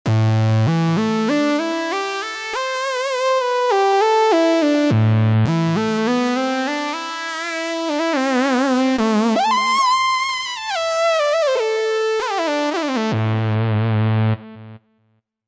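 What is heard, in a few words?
Promars Lead, Roland SRX-07 Ultimate Keys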